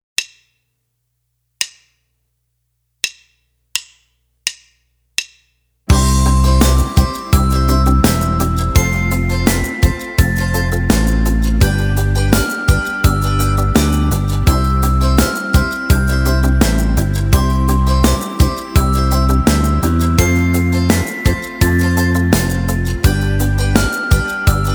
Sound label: glockenspiel